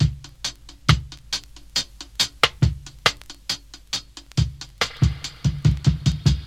69 bpm drum beat c sharp key, Free drum beat - kick tuned to the C# note. Loudest frequency: 1915Hz